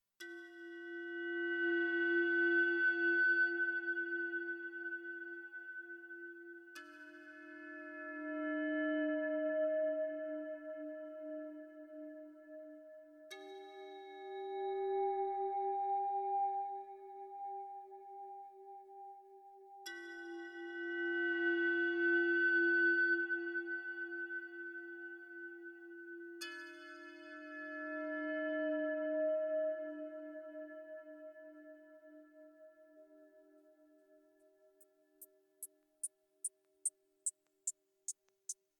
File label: Jam with bells on